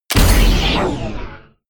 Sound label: sci-fi click negative heavy; hits impacts